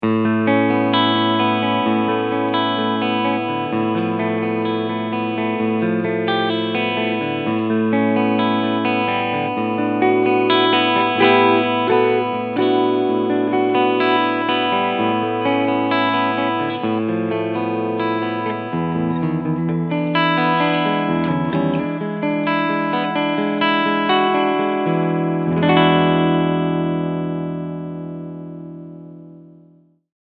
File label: SC 550 SL Tribute Cleansound beide PUs; Harley Benton